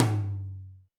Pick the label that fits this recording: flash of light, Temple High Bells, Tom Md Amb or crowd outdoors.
Tom Md Amb